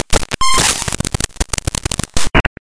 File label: Pop Glitch Sounds